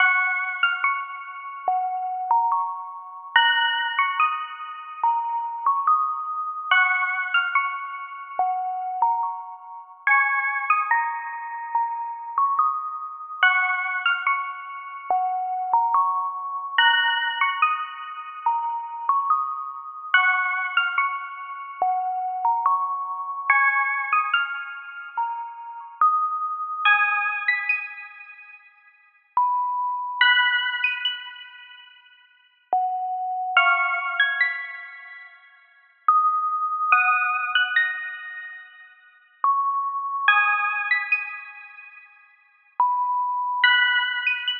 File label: winterbell, music box